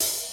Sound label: quiet open high hat sample D# key